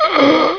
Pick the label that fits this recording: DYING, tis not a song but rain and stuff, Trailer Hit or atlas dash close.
DYING